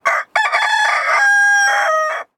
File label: Kokouukuuu